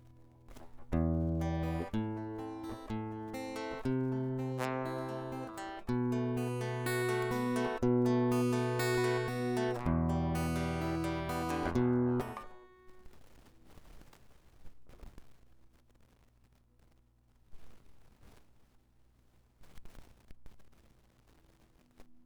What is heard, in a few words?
guitar